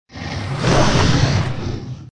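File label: animal roar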